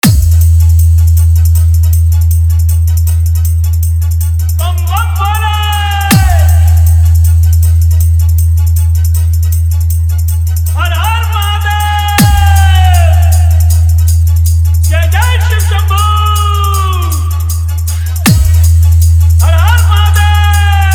Full Dhollki Bass Rod Dance Mix

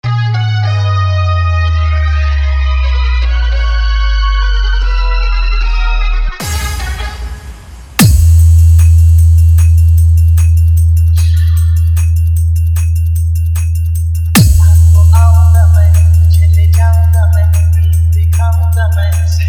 Faadu Dhollki Bass Dance Mix

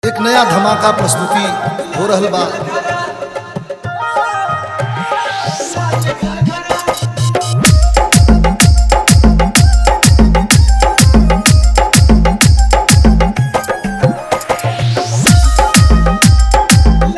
Hard Dhollki Bass Mix

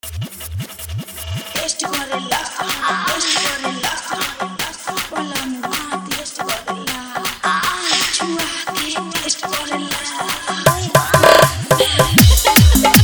Bhojpuri Full Dhollki Bass Dance Mix

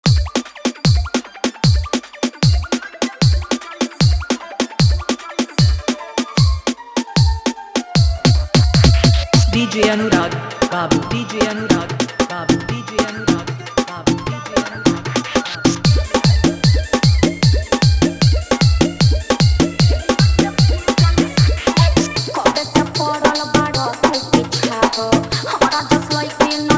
Hard Bass Mix

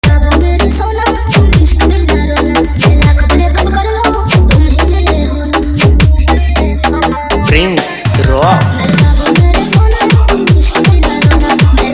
Hard Dirty Dutch Electronic mix

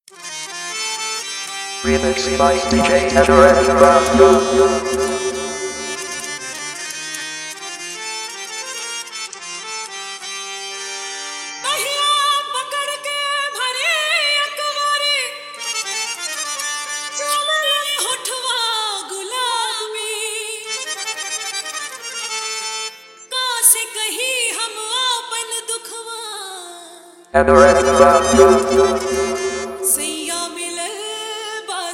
Hard Bass Dance Mix

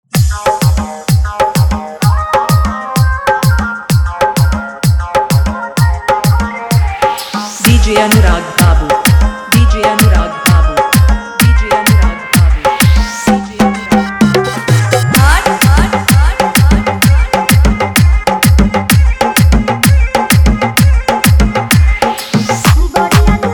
Hard Dhollki Bass Mix

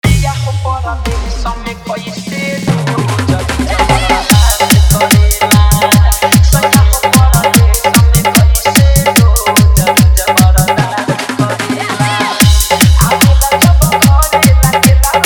Full Dhollki Bass Dance Mix

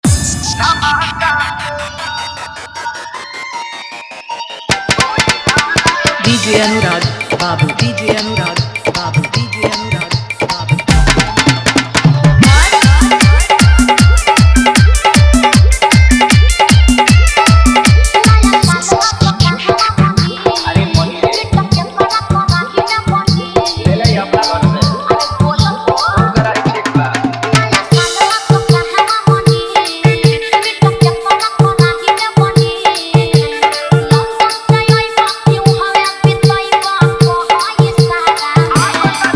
Full Dhollki Bass Dance Mix

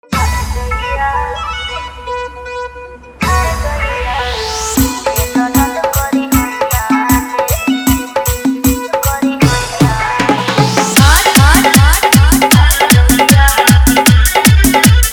Bhojpuri Full Dhollki Bass Dance Mix

